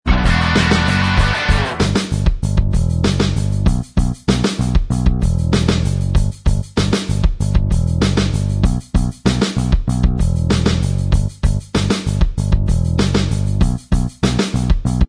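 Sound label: instrumental, backing track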